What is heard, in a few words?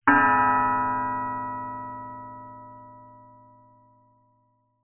bells buddhist chime ring